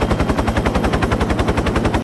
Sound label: Helicopter Loop; blockade classic